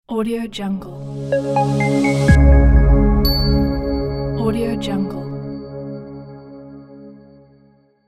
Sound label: clean logo